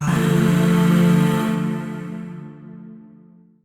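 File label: Shawzin Major Chord; Warframe; Zariman; Void Shawzin; Chords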